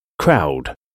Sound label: crowd